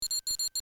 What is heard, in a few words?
tricorder